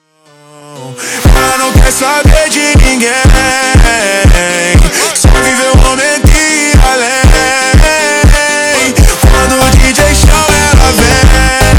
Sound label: slowed, funk